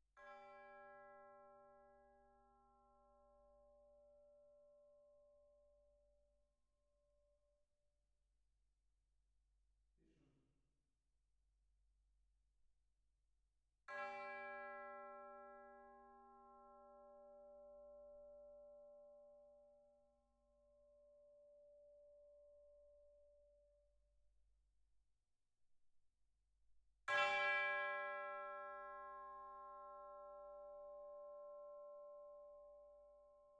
Twin R Perc

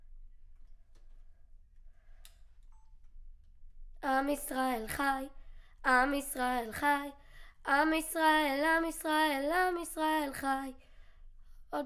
Children Choir; Studio Bekol Children Choir

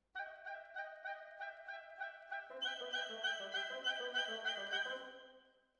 Violas, jazz for piano

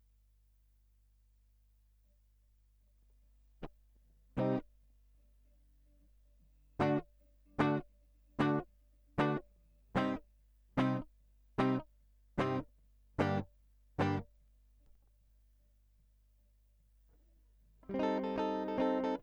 Gtr Line

